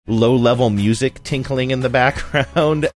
low level music tinkling in background

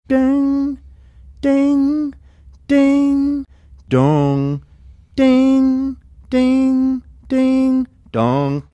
ding ding ding dong ding ding ding dong

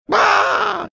imitating scream

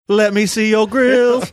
singing let me see your grills

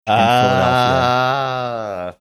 drawn out ahhh

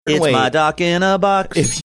singing it's my doc in a box

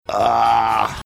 aaahhhhhh

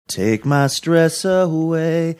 singing take my stress away

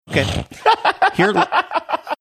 snorting laughing

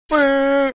making air horn sound

